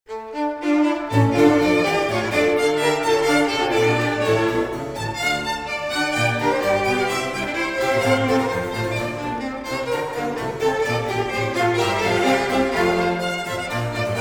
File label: Violin Sonata in D minor, op. 5 no. 7- 1. Preludio. Vivace; Corelli